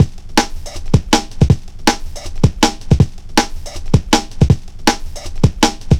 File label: 160, Drum Loops, Drum & Bass; Total Drum&Bass